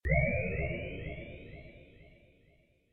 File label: grow; flOw